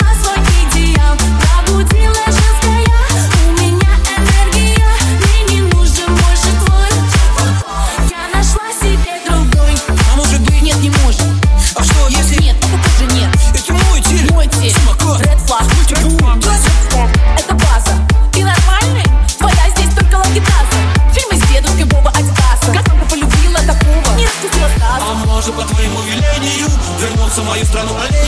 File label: remix